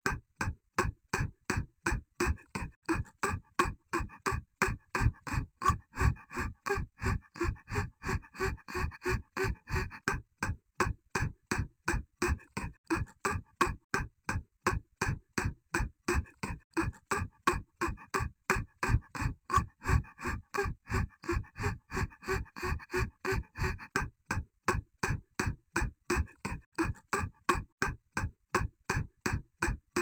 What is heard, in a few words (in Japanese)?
アッアッ 早い